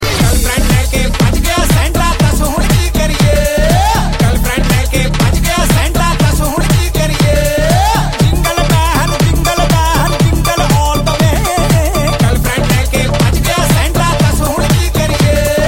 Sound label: Jingle Bells